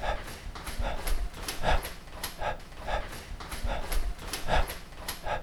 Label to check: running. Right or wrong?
Right